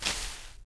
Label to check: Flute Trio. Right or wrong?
Wrong